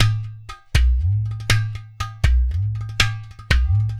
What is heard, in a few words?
DRM